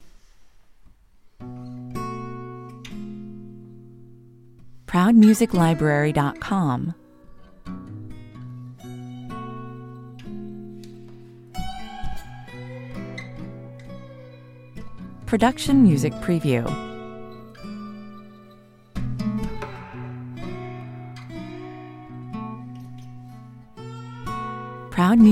slide guitar 104 bpm

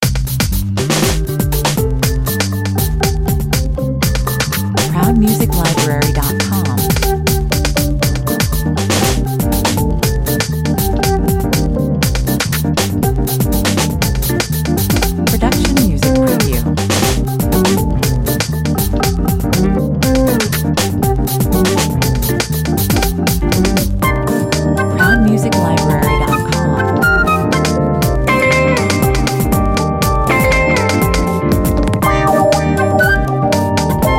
lounge 71 bpm

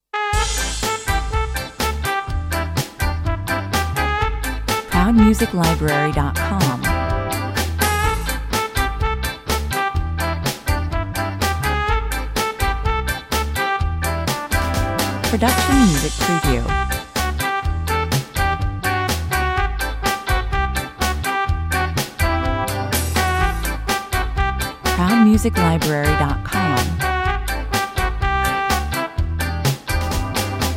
rainy day ska, 125 bpm

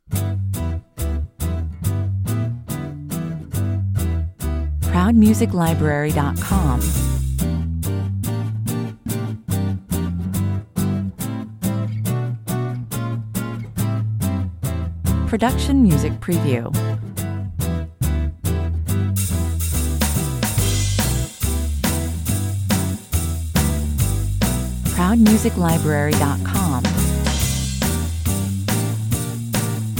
swing, 141 bpm

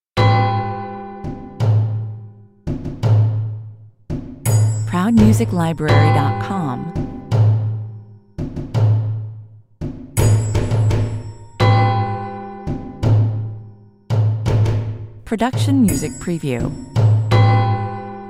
lost relic (drums only) 84 bpm

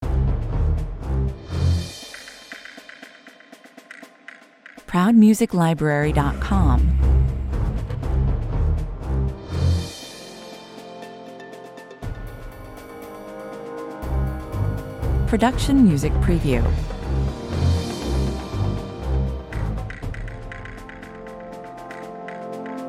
approaching threat 120 bpm

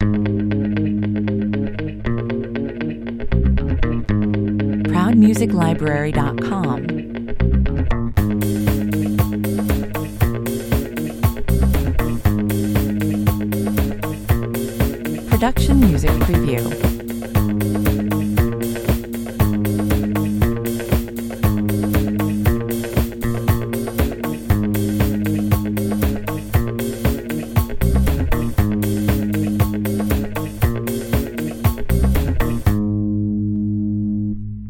my little reggae 118 bpm

